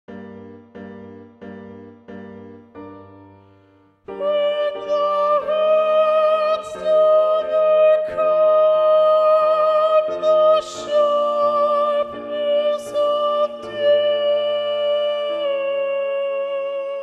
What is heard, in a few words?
Soprano; When Thou hast and Thou didst open; Handel; Te Deum